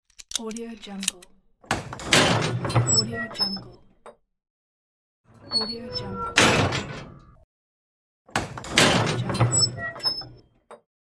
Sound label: grating metallic door